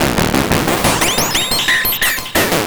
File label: Serge, Synths Arpeggios; Electronic Point Blank